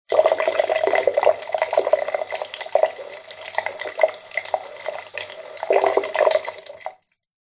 household bathroom sink basin faucet empty tap trickle short